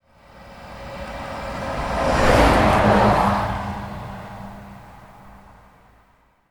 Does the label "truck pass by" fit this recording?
Yes